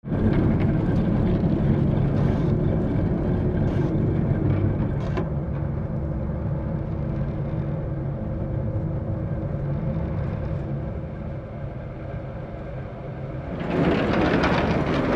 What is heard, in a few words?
cable car ride